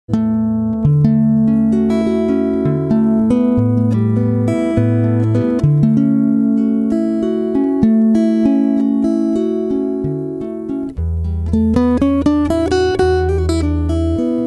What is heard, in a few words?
floating guit